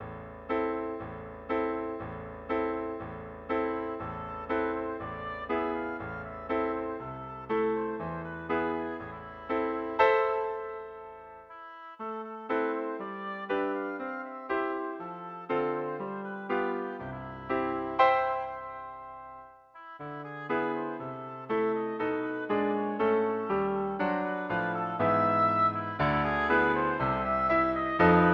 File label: grieg solveig, oboe; Grieg, Edvard - Solveig's Song from Peer Gynt Suite No.2